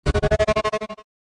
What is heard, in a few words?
stutter sfx